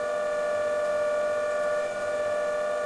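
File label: crane elevator loop; machines mechanical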